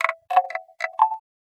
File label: Mbira Bamboo, Acoustic Beats; Sonic Foundry (Sony Creative Software); Myths of Technology